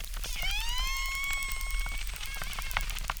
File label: Chirp zap, acquarium